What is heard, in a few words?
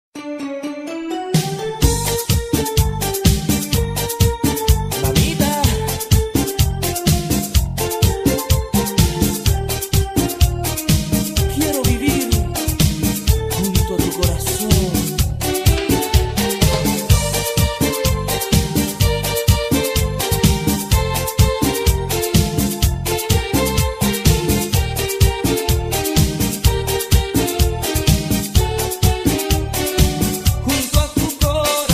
MERENGUE